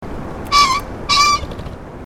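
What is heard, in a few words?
green pheasant; kiji; oomameda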